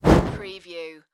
Torch Pass By Whoosh

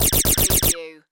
Laser Machine Gun; Sound Effects Bible

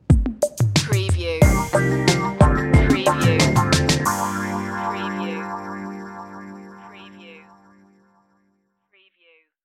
mellow retro stinger

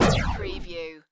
Laser Gun Single Shot; Sound Effects Bible

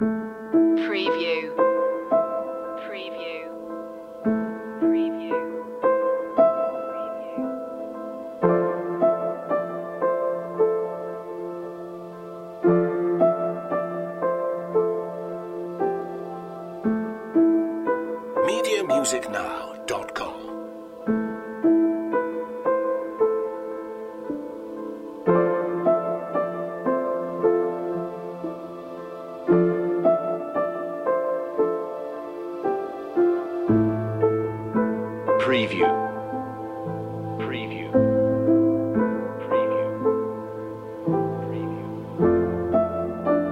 piano drops

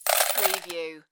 Slot Machine Win - Coins Falling Into Payout Slot

